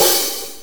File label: HiHat, Blues; Sonic Foundry (Sony Creative Software); Drum Tools